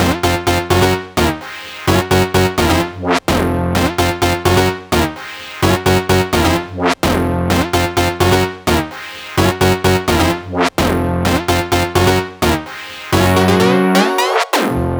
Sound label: Melody 128 BPM Root F; Vengeance Samples; Melody Kits